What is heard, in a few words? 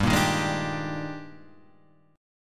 Eb Major 11th G, guitar